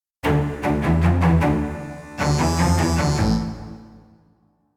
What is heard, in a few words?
Combat In; Skellige